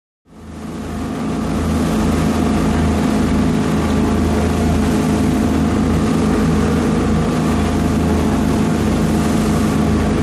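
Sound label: Power Boat Race Ambience, off shore power boat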